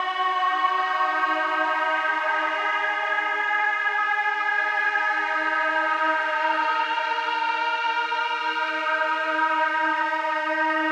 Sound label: ambience magic bad; smite